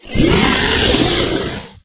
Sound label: m flesh death; STALZONE